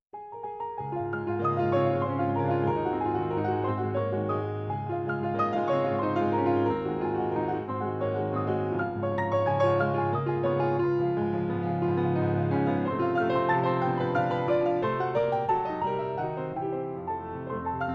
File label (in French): Valse; Marie Jael